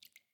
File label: Cave Random Drips; The Inn